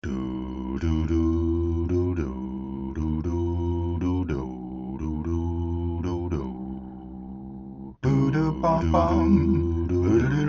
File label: QuatraVox samples